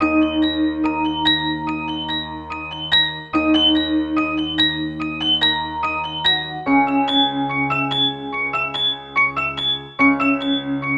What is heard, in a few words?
Bell Spooky Celeste